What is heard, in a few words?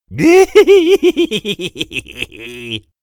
Hehe; Freaky Clown Town Mystery; windows